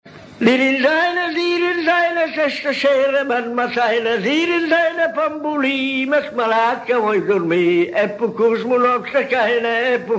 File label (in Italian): canti; dirindeina dirindeina